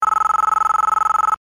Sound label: Nokia Tone; Matrix